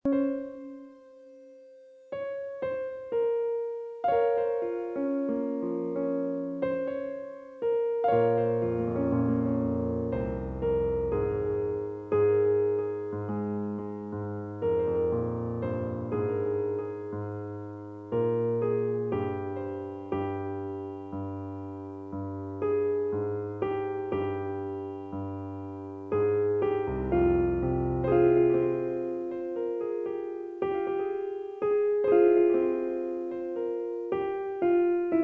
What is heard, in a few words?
Piano